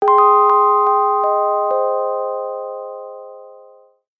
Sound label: short rest, jingle